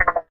ui coop hud deactivate; Source Engine; Portal 2

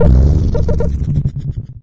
dog phrase; npc; source engine; episodic

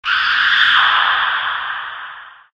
female scream, halloween; Source Engine; tf

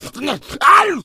hunter shred, attack voice

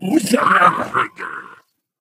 ballbot fist pump

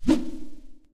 foley whoosh; player

